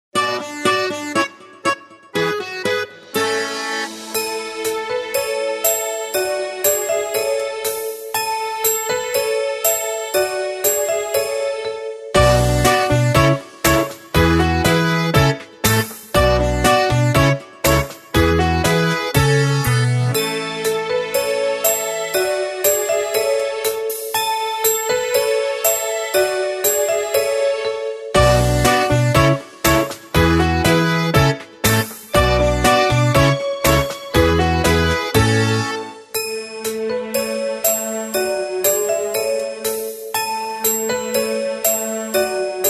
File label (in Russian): fonogramma palchiki ruchki russkaja narodnaja melodija; horovody i plyaski dlya detej ot 3 h do 4 h let